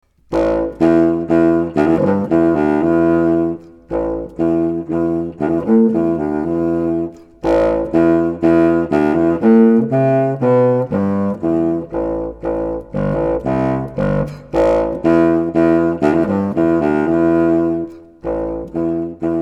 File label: Classical Funk